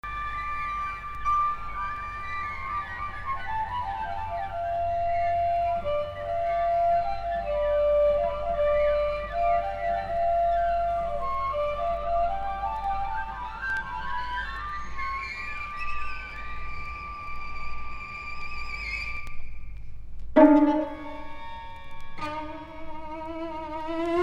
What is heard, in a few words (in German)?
STREICHQUARTET